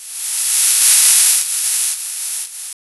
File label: the sound of sparkling pa, powder